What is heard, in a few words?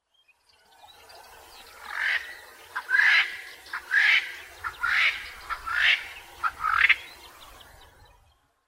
double spurred francolin